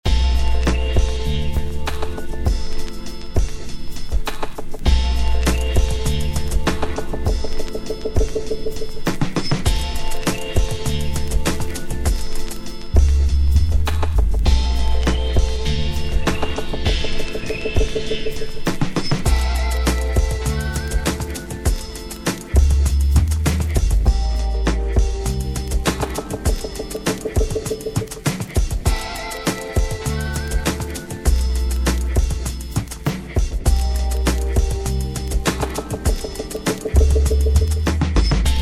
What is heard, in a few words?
Hip Jazz interlude